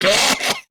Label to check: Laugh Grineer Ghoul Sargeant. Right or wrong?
Right